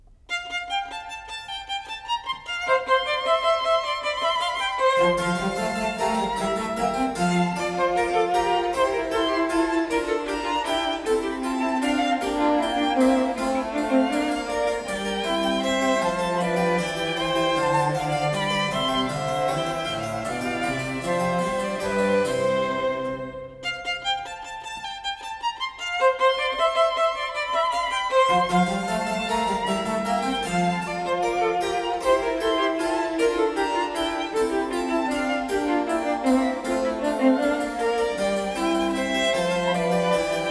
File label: Trio Sonata For 2 Violins And Basso Continuo No.5 in F major; Vivaldi